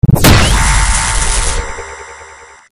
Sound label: ar2 hit